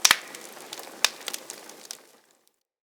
Campfire Crackle; Props

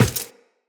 Run Default; Footsteps; Characters; Tenno

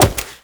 Wall Jump; Warframe; Tenno; Wall Run

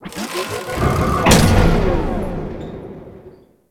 Ship Metal Mech; Warframe; Corpus Ship Remaster

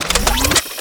Charge Up, Archwing Primary; Warframe; Larkspur Prime

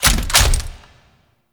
Weapon Pickup; Gameplay; Kahl; Warframe; Veilbreaker; Devourer Kahl

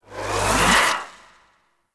Amplifier Chassis Charge Up; Weapons; Operators Amp Chassis Part A Charge Up B; Warframe; Amplifiers

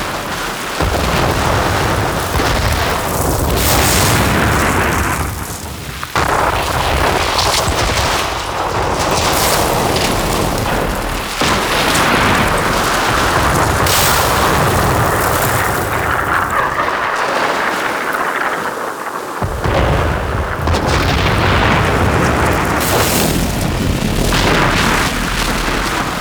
Gas City Arena Destroyed State Amb; Warframe; Corpus

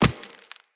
Walk Rock, Footsteps; Operator

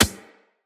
Beach Ball Bounce Dirt; Water Fight; Beach Ball Floof

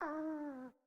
Kitten Snore; Catbrow

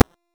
Short Sword Medium Parry Impact

